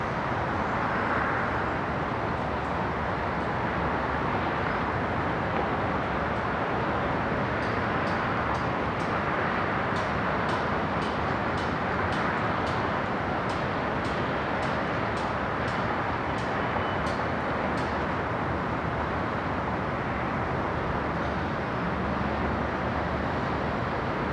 Generic City Ambience; Megacity

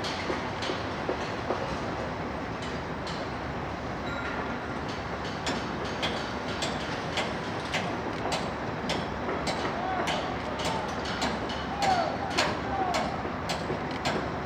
Building Blocks construction; Buildings; Megacity

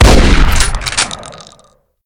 shotgun; ML-Agents; FPS Game

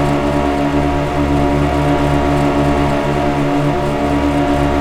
Race Boat High Speed; BoatAttack

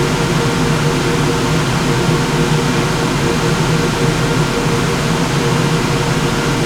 amb ventilation loop; room 1; ambient

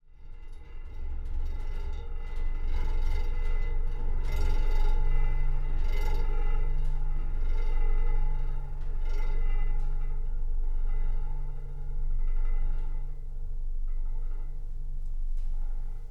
amb vibrations; room 01; ambient

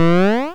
8 Bit Jump

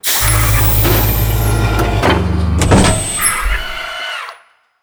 door close

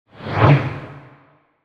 table fx clear, Hologram; SpaceshipDemo

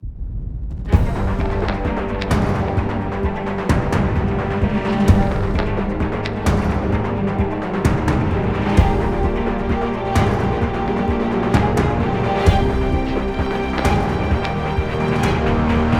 Space Combat, Music; Unity Space 2018 Combat

